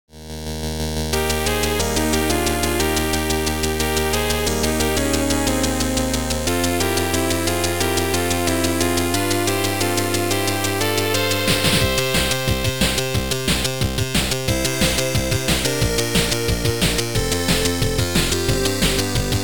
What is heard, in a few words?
PC Engine Chip Shooters